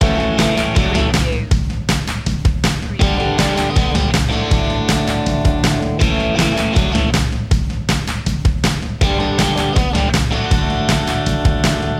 moving forward loop

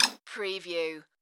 Tui Flutter

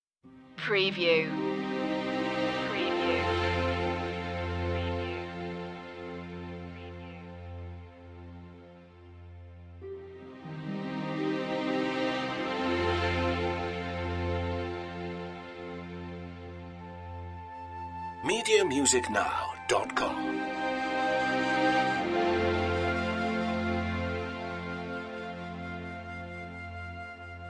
magic forest no drums

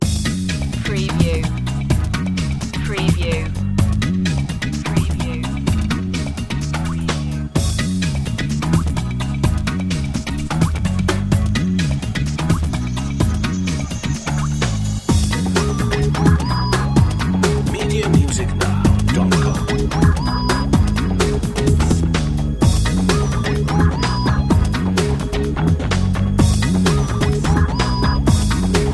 funk

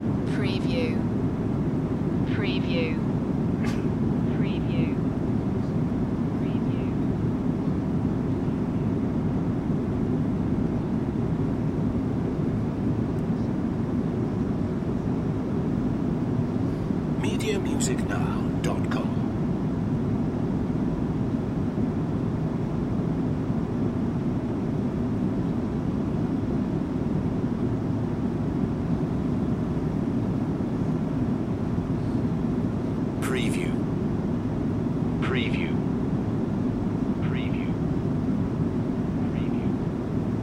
airplane ambience

